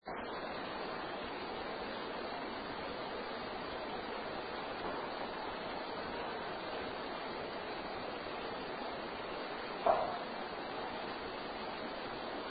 Art Room pop; Fuel Building; 2nd Floor

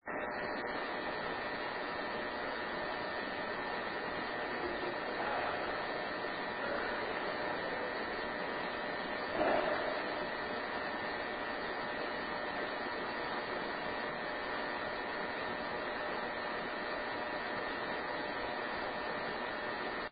Art Room knock; Fuel Building; 2nd Floor

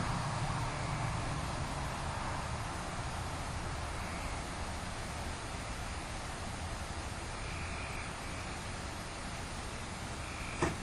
Ticket Booth Unexplained Knock; Case 022 Lake Shawnee Park; Olympus Plug; Footsteps

